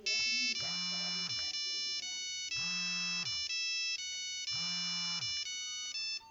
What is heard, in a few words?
nokia 5510 ringtones